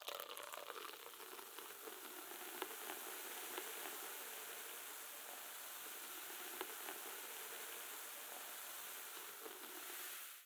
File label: damage vehicle; World Of Warships